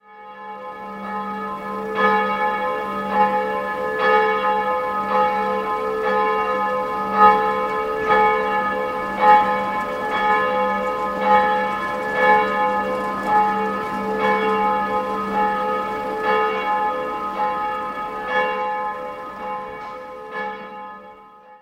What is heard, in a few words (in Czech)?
chrudim zvon; zvon dominik; arcidekansky kos.